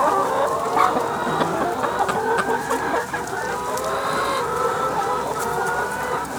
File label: chickens; openffa; aggrocult